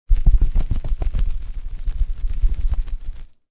Footsteps

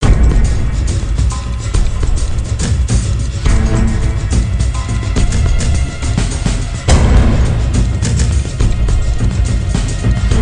Track Music Action; Emergency Kids; NDS

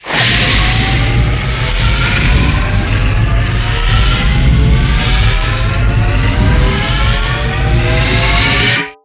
dash attack; Pix the Cat

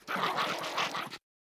Miniboss Growl; Super Mombo Quest

